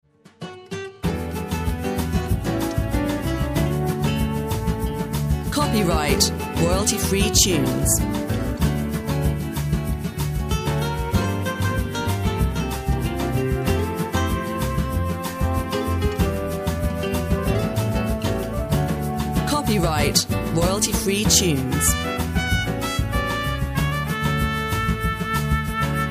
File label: Samba the Night Away